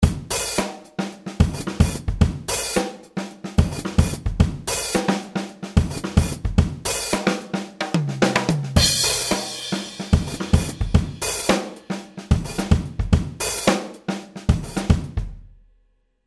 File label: Tama Star Classic Kit; Factory